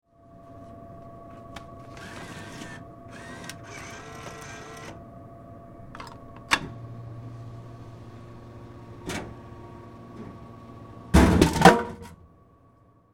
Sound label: Industry Vending Machine Dispense Soda Sequence; Machinery; SFX Bible